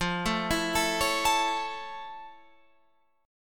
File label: F Augmented Major 7th F Guitar Open F; F+M7; arpeggiated